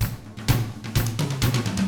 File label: 127 Drums